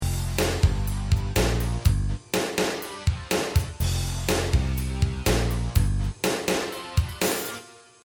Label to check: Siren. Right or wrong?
Wrong